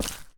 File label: step, dried ghast